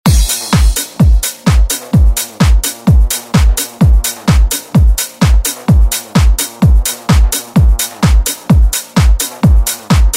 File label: Tech House; Deejay Hit